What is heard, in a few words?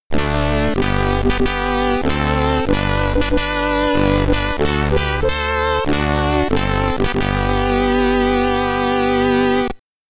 Eastern Europe O Tannenbaum